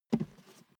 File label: step barefoot metal single right